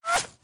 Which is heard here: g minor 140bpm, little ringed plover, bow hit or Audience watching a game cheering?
bow hit